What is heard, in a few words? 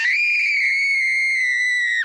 red larva scream